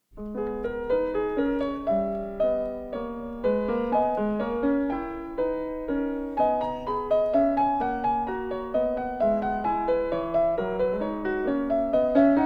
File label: Prelude in G-Sharp Minor, Clavier; Prelude & Fugue No. 18; BWV 863; Bach; The Well-Tempered Clavier I